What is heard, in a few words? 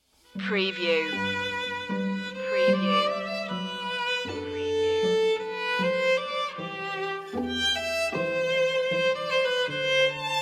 sinfonia string quartet; bach; ich steh mit einem fuss im grabe; bwv 156